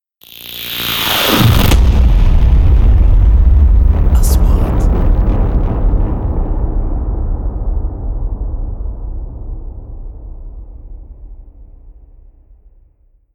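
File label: 3D SFX Trailer; مؤثرات تشويقية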